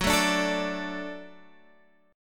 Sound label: F sharp Minor Sharp 5th, Guitar Standard; strummed; 14,12,12,14,15,x